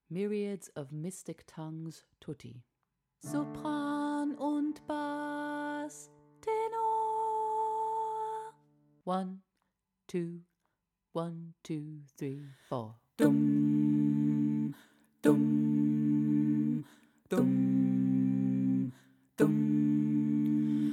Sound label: tutti